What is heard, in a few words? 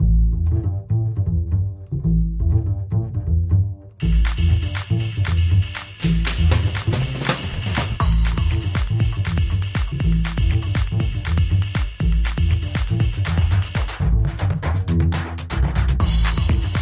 Intro music